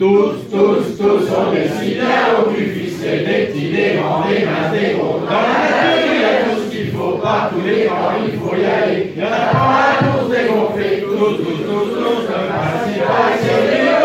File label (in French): refrain chanson